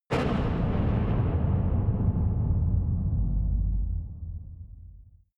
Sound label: massive metal impact; impacts